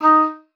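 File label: oboe d'amore D#4; Xsample VOL-7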